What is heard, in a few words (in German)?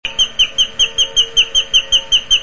zirpen